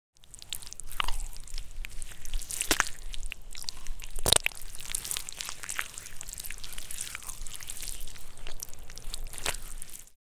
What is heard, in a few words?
Mud squish bubbles sloshing